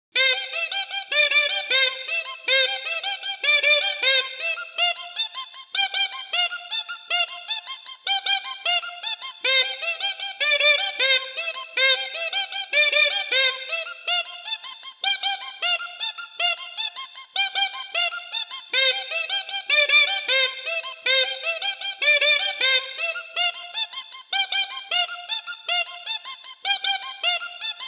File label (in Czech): organ varhany